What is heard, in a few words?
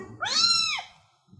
meow